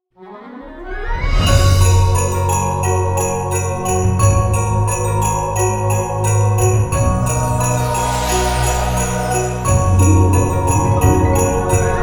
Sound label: Magical Christmas Logo